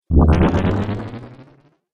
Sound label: Dubstep Audio Laser